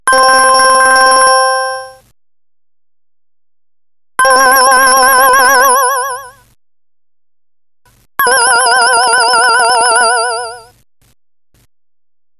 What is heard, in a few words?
BATTERY; ringtones